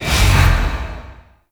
Void Dragon Baby Mine Boost; Enemies; Gameplay; Warframe; Duviri

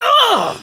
Death Prime Grineer Female; Tau; Battle Barks

